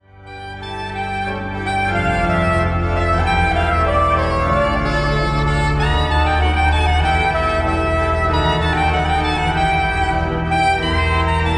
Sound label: toccata, orgel und sax